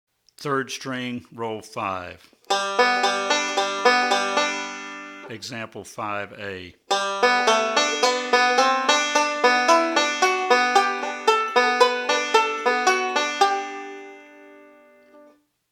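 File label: Rolls For Melody On 3rd String, banjo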